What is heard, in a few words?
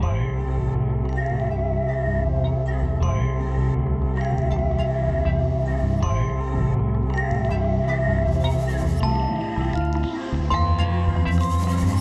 Drones & Textures